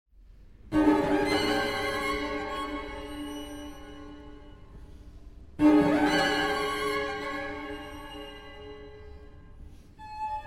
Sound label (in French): quintette avec 2 cello 2eme mvt